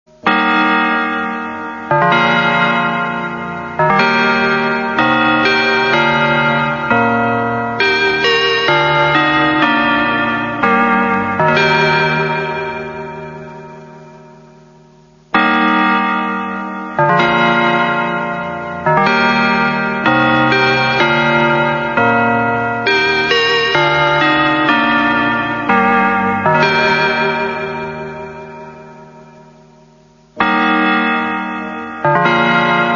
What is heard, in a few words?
Interval Signals